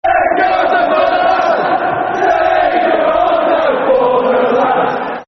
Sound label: Sing Yer Hearts Out For The Lads, Football Chants; Wolves; wolverhampton wanderers; fanchants